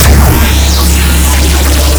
beam

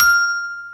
Bell F6

